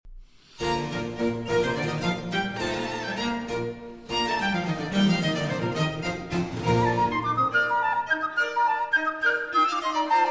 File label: Concerto For Piccolo, 2 Violins and Basso Continuo in C Major; III. Allegro